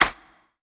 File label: Clap; blue archive